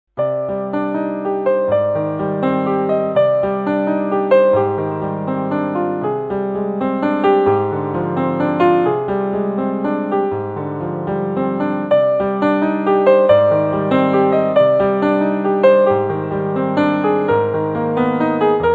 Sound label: rejection piano, solo piano